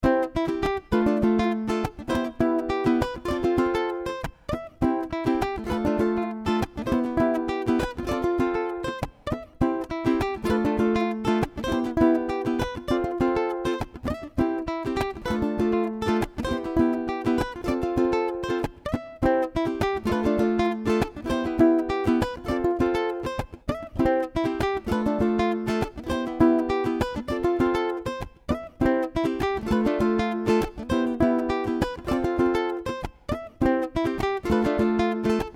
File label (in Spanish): Melodia Ejercicio Completo, escalas